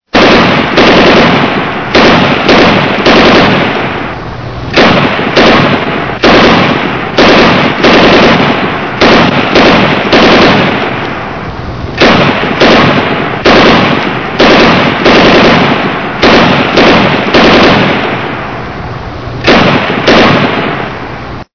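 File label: strelba, shooting; Стрельба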